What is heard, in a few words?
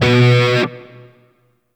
Shawzin Oct One B; Warframe; Grineer Shawzin